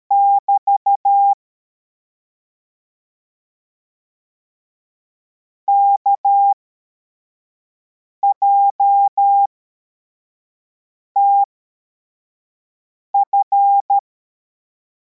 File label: cw, morse